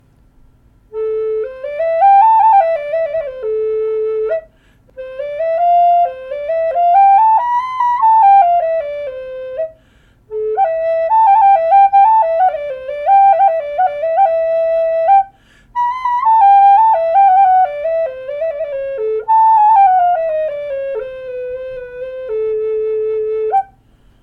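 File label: Chechen, Native Style Flute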